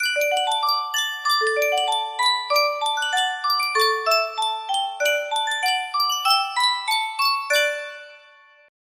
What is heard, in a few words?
sankyo miniature music box